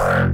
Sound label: Reese design, bass